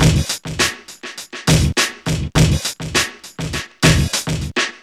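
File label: 102 bpm, Funky loops; Zero-G; Funky Elements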